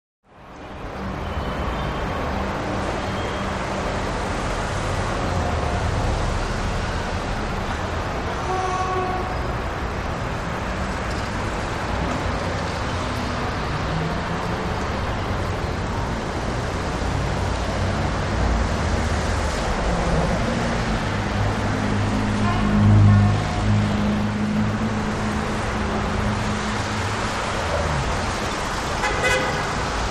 New York City Traffic, big city

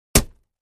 Axe Paneling 1 Side, axe hits

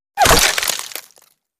Bullet Impacts Wood I

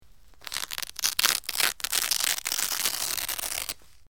Velcro Rips Close

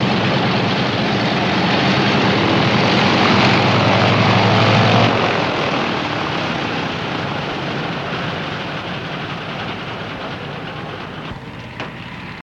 B29 Shut Down Only Tax, b-29 superfortress

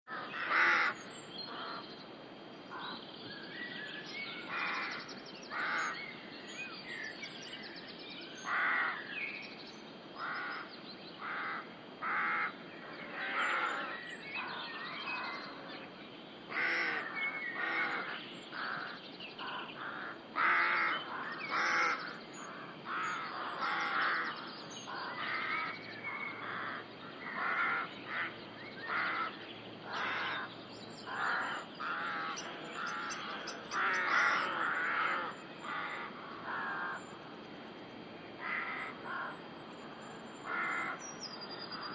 Rookery, Rookery U.K.

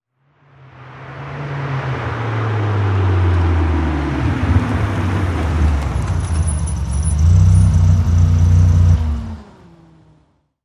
Approaches From L To, sports car

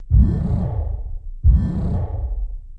Starship Shields Up

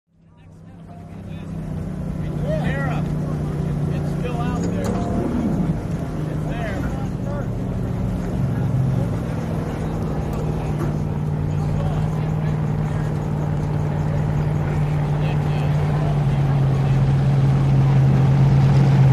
Boat Race Pit Doc Amb, motorboats

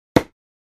Dart Hits Target, darts

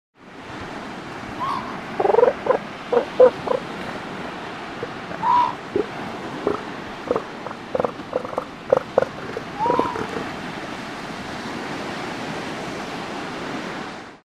Seal Elephant Growl

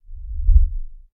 Subwoofer Sngl Whoosh

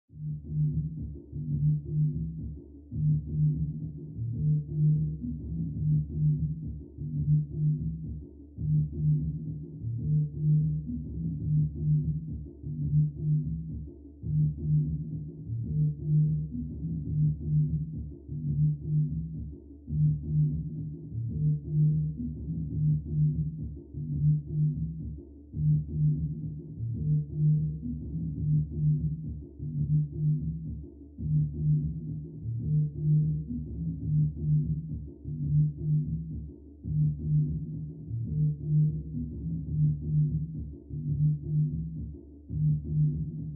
Mx Electronic Dance

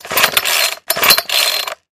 Chain Saw: Cord Pull, False Start

